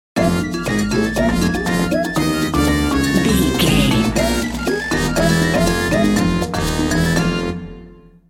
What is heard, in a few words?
jungle hijinx stinger